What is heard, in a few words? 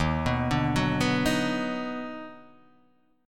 D sharp Minor Major 7th Sharp 5th, Guitar Open D